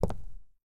Footsteps Concrete Shoes; starbites

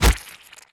Honey Orb Break

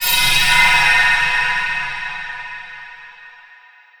Thunder Spell; Dark Magus Thunder Spell4

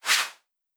Saber

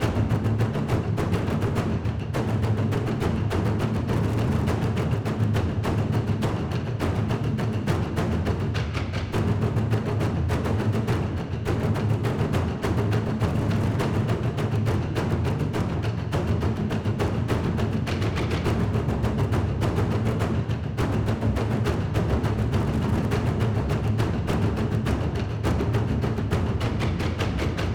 Colosseum Intensity

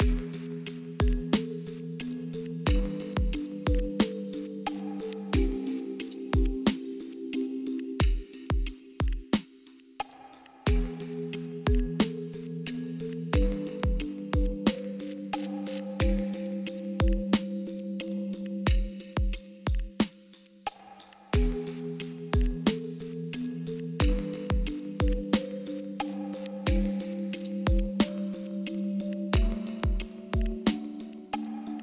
Barracks Major

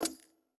Field Item Get; starbites

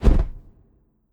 Hawk Flap

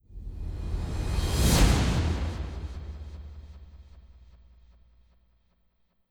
Rise

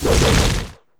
Candlestick

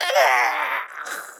Imp Death; Folk Hero

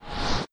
Balloon Appear; Mage

